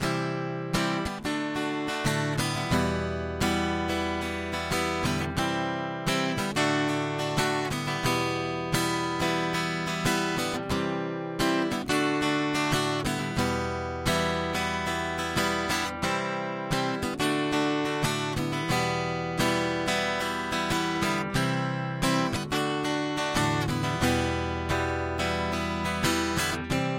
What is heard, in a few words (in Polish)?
refren